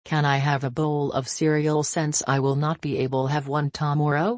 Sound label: yyyyy